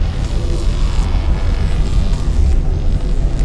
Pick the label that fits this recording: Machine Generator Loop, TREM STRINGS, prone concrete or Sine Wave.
Machine Generator Loop